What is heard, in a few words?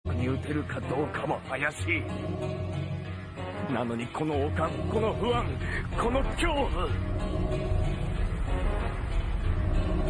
yell